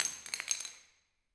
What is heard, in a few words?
Ceramic Smash